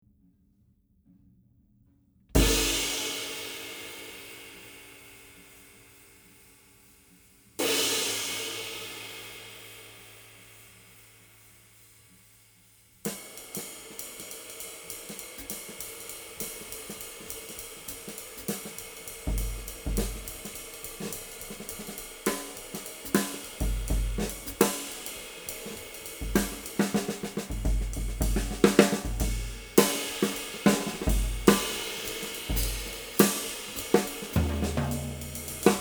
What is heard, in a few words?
Spizz 22